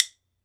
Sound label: WOOD BONE, Ireland; E-MU Producer Series Vol. 7 – Old World Instruments